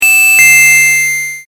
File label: correct; もっとゆっくりのピンポン高音ver; 正解の音・ピンポーン